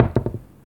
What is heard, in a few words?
Small Ball